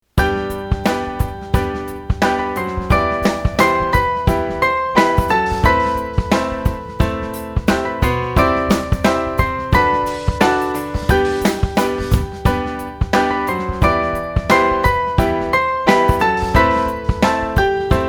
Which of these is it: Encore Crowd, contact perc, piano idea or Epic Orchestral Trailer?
piano idea